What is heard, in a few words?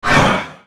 effort; Nocturne